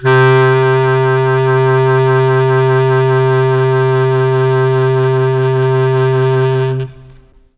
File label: C2 clarinet, Mellotron; Mike Pinder - Mellotron Archive CD; CHM CLARINET